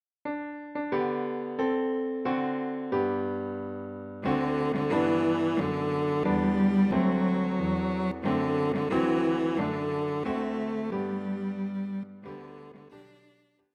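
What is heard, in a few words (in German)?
Happy Birthday Violoncello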